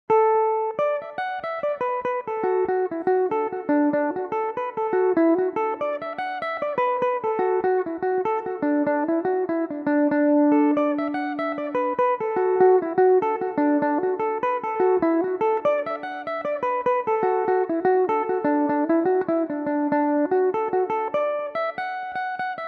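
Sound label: D major, Irish mandolin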